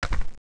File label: Bat Flap; the-purple-night